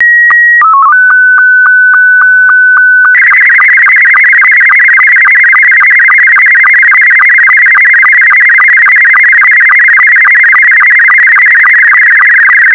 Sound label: SSTV Scottie2